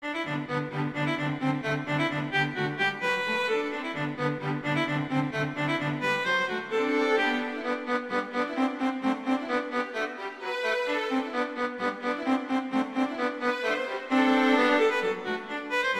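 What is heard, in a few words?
Duet, Rondo Allegretto, viola; Mazas; Duets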